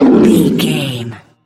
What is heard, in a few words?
troll monster hurt pain short